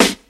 airy steel snare drum sound g key, snare drum sample tuned to the G note; snare drum samples